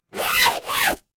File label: paper fabric zipper